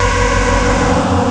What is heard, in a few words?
noise; Amiga Soundtracker Sample Packs